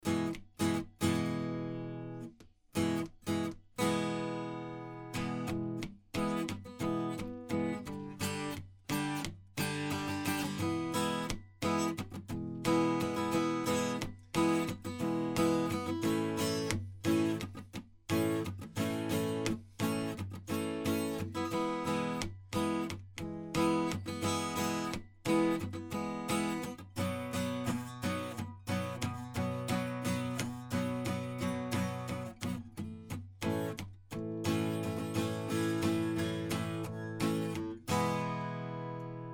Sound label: acoustic guitar